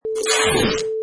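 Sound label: Masking Tape Rip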